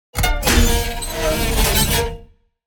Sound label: Metal wires dissonant phrase